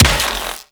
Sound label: Rifle Shot; Warframe; Infested; Quanta